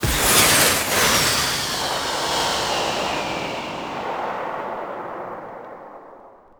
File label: nebelwerfer; wet